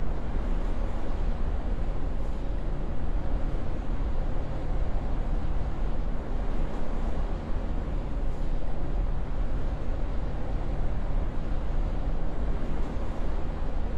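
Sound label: Ambient Bossroom Loop; silent hill homecoming 2008 gamerip pc ps3 x360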